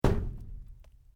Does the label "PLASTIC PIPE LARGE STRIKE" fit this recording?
Yes